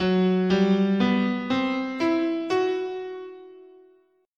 Gb Iwato scale

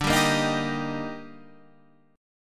D sharp Major, Guitar; strummed; JGuitar

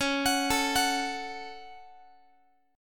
C sharp Suspended 4th Sharp 5th, Guitar; C#sus4#5; arpeggiated; x,21,21,19,22,x; 4A,4E,5A,5D,5G#,6C#

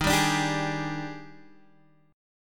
Eb Major 11th, Guitar, Standard; EbM11; strummed; JGuitar

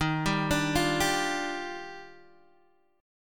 D sharp Major 9th, Guitar Open D

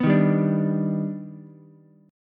Eb Augmented Major 7th Eb down Ukulele; Eb+M7 strummed; JGuitar

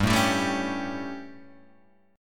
G Suspended 2nd Flat 5th G Guitar Open G

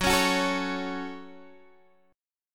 Gb Minor, Guitar, Half Step Down

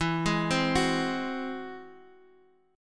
Ab Augmented 7th E, guitar

